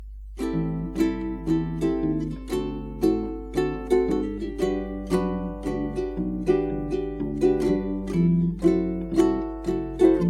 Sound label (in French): walking bass exercice